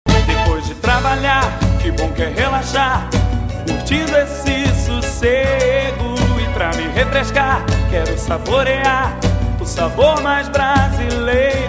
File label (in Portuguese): jingles guarana; mineiro